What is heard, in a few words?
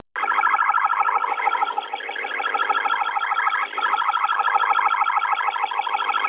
AM glusenie